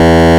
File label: Sawtooth E1; Ableton Foundation FreePacks; Foundation Glitch Toys & LoFi